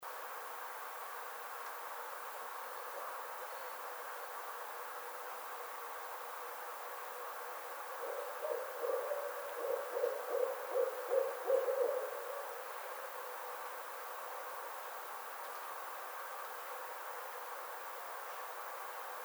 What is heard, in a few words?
Barred Owl